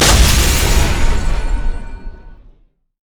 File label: hammer smash; LoL; taric